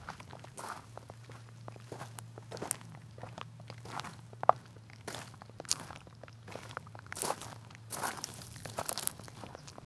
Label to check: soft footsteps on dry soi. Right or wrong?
Right